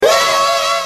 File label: wah blooper